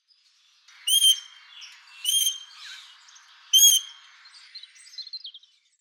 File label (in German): Jagdelster Cissa chinensis; Vogelstimmen